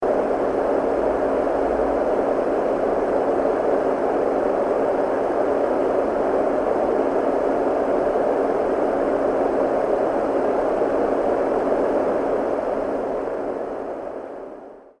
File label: whish Dohm